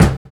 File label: kick, Drum and Bass